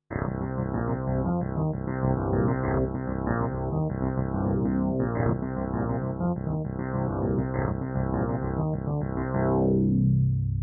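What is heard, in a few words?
K150 synth bass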